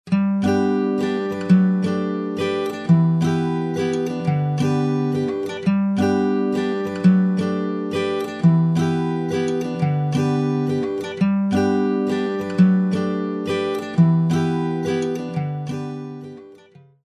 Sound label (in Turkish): santral fon muzikleri